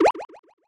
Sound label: Emotion Sigh